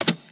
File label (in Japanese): ドア開